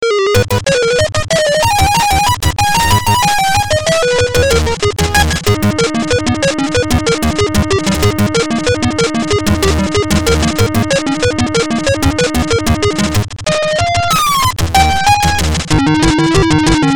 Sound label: synth; extreme play with me